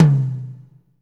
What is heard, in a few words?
tom, lite jazz, Roland